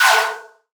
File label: Simple Gravity Switch FX Am6 Gliss Down; Video Game; Cymatics; Video Game Sample Pack Vol 1